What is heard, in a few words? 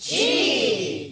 reaction, ambience; Birthday Party Bash; Wii